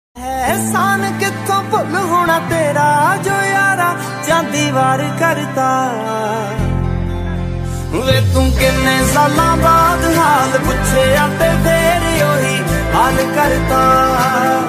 Punjabi Song Ringtone

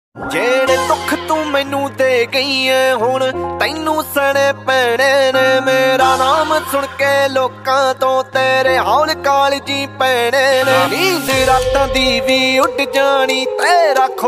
Desi Punjabi Ringtone

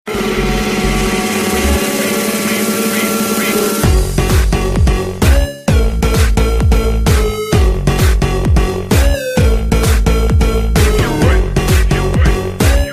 Work Mix Ringtone

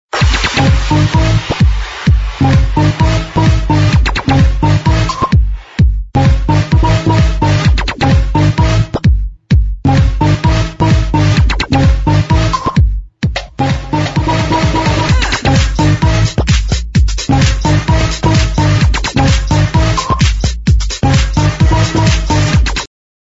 Techno House Ringtone

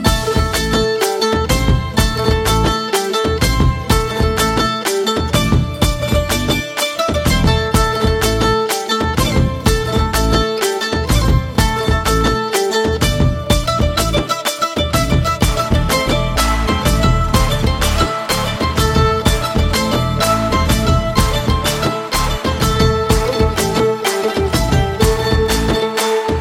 Instrumental Tone, Telugu Ringtone

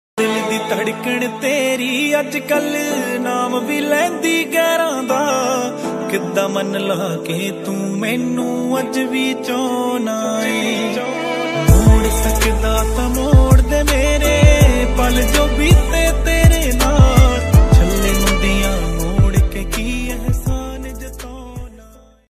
Trending Punjabi Ringtone

